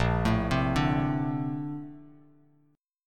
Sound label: Bb Major 9th Bb down Bass Standard, BbM9 chord; arpeggiated; JGuitar